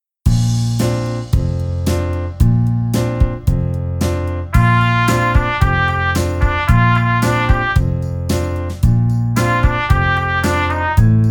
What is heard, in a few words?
Trumpet; easy beat vol 1 trompete